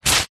household telephone directory yellow pages page tear out